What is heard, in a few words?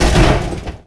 Impact Medium; Totaled; XBOX